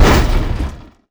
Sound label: Touch In, Damage; DCS